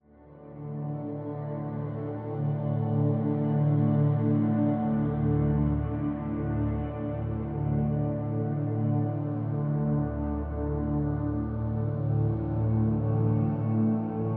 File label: Atmospheric Flute Soundscape; MatiasMacSD